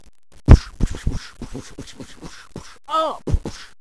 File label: punching